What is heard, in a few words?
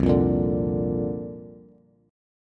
C 7th Suspended 2nd Suspended 4th, Bass; strummed; C7sus2sus4